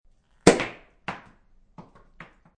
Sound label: Champagne Cork, Champagne cork blasts off!; Corks (Sound Effects:Bars)